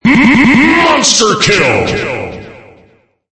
monster kill; arena

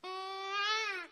death, rabbit; mob

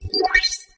display, exchanger; block

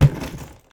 drop box

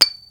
bomb fall

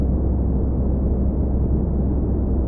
plane

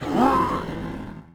ogre damage

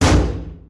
metal door, hit

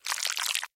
parasite

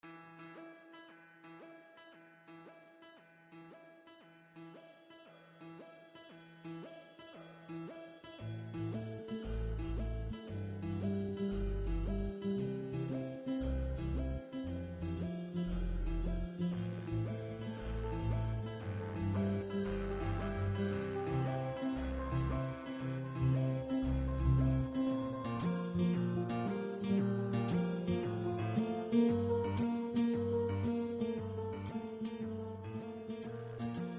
calm, music

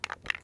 crab live

